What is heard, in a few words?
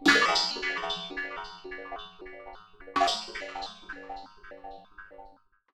Ring Mod, Cymbal, contact mic; Murf & Ring Mod